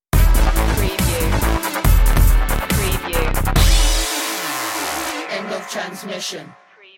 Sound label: beats stinger